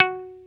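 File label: guitar, Dan Electro, 6 string; Dan-O 6 Str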